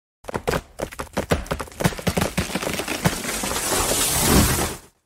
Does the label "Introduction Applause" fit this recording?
No